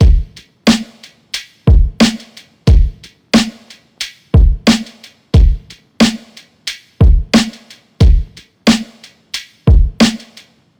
Drum Loop, 90 BPM, Boom Bap; Drum Loops; Armed; Cymatics; GHOST (Beta Pack)

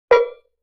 Cowbell Mid Damp; Vintage Cartoon; Drums & Percussion

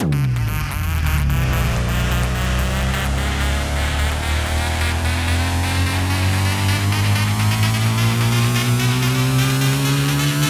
Tension Riser - 128 BPM G; Cymatics; Risers

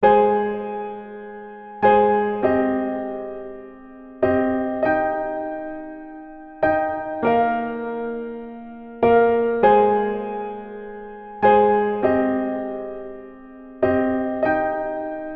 Pop Piano 100 BPM D# Maj; Instruments; Piano Loops; Cymatics; EDM Starter Pack

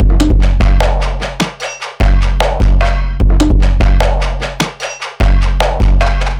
Drum Loop - 150 BPM, Experimental; Arrival

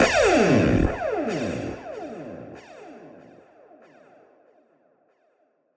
Zipper F# 142 BPM, Psytrance Zaps & Filter Sweeps; Jack The Zipper; Ghosthack; Psytrance Visions Volume 2

